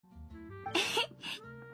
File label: anime ehee; anime meme